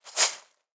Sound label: pasta box shake